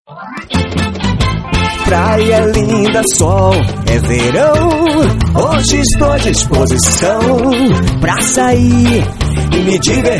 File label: Jingle; Jingles radio